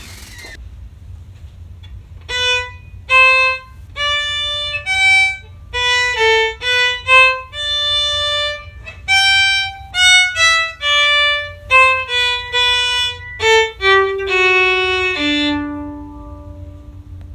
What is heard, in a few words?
Gavotte